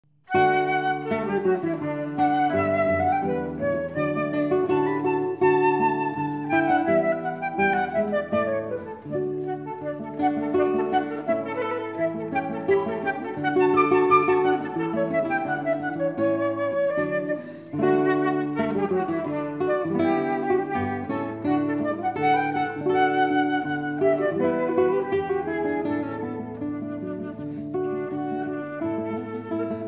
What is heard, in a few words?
Duetto Polonaise; Moravian Hymns; instrumentals